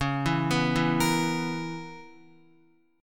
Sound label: Db Minor 13th Db Guitar; Dbm13 arpeggiated; x,4,4,4,4,6; JGuitar